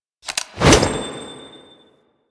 Grenade Throw; Warframe; Quests; The New War; Kahl; Abilities

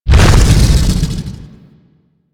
Biped Vocal Death; Warframe; Man In The Wall

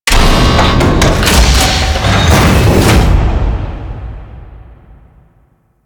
Fortress Bridge Door

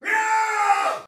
Battle Cry, Soldier Male; Sinecure

